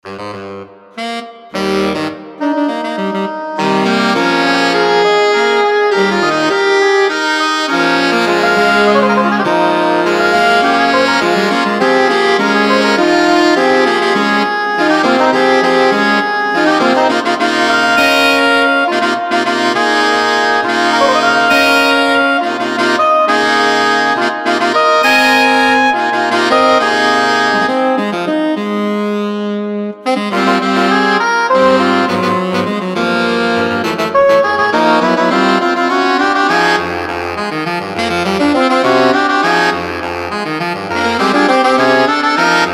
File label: Sax, MIDI; Benincasa L